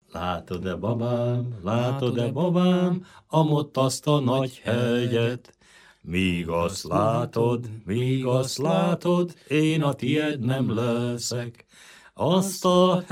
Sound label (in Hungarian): nepdal; látod e babám